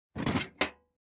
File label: Metal Tool Box Open Metal Rattling